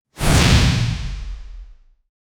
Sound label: powerful sci fi jump sound, air burst